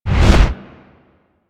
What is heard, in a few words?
Camera Woosh; Warframe; Grineer Thumper Bot; Spin